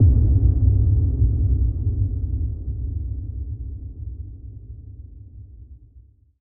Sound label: Impact